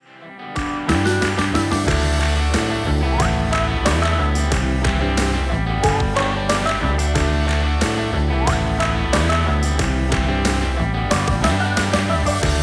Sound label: Fun Wistle Kids, music for videogames